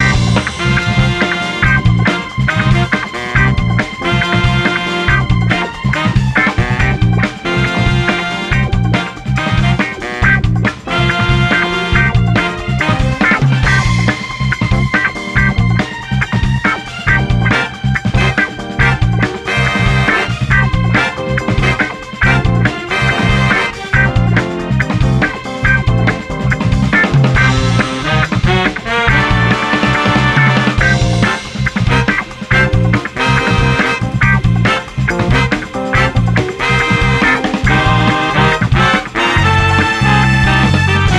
Bari Sax

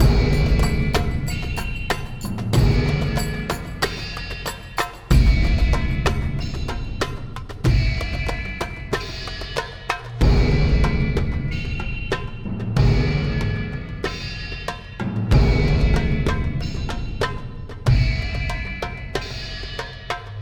Puzzle Loop; The Vale; prince of persia 2008 gamerip

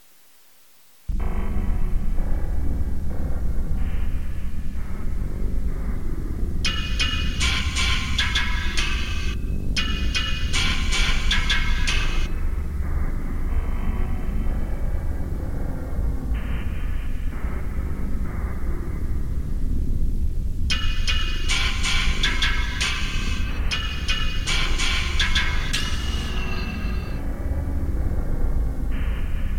Warning; Baldi's Basics Plus

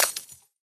Lantern Break; Level14

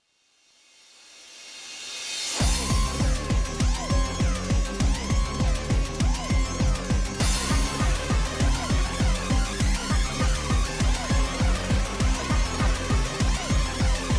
Field Peak Loop; Glitch Busters Stuck on You

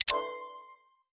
Lamp Out

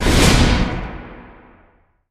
STINGER THREAT DEFEATED, soundtrack; transformers war for cybertron gamerip

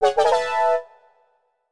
Jingle Microgame Clear; WarioWare: Smooth Moves

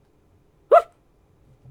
Male Reactions; a dump in the dark

